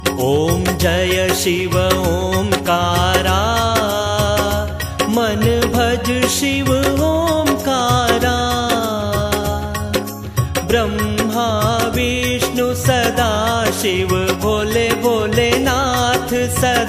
shiv aarti ringtone; om jai shiv omkara mann bhaj shiv omkara

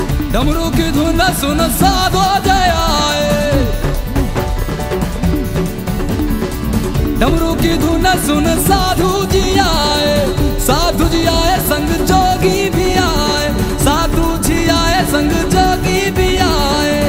damru ki dhun ringtone

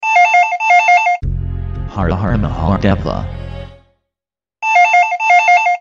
Har Har Mahadeva message ringtone

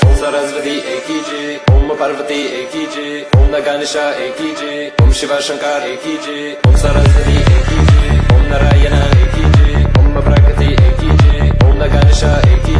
trance ringtone

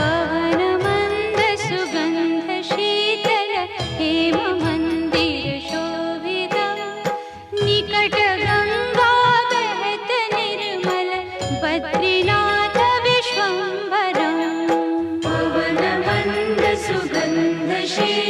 aarti ringtone; badrinath

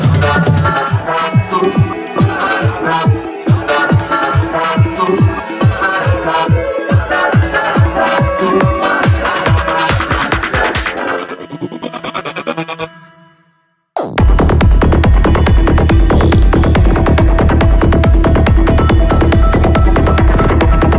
trance instrumental ringtone

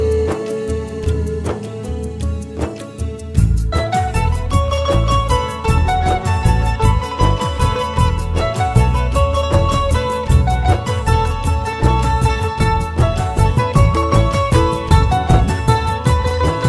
instrumental music ringtone

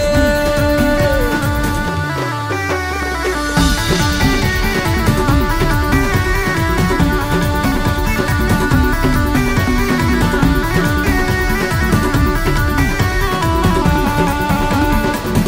instrumental music ringtone

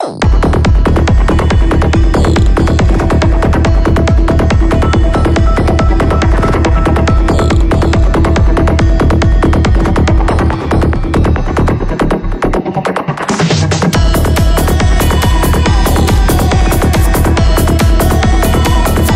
trance instrumental music ringtone

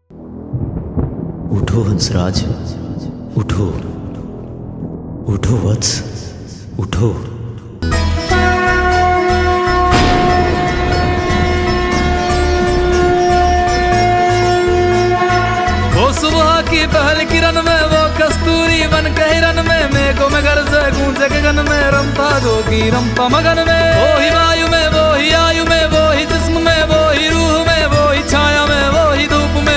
morning alarm ringtone; utho utho vatsa lord shiva

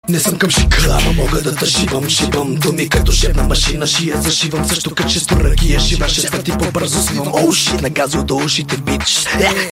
fast rap ringtone

